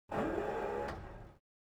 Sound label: xray, ambience; Soldier Of Fortune II Double Helix; XBOX; shop